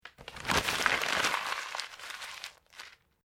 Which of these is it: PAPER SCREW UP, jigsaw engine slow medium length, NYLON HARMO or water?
PAPER SCREW UP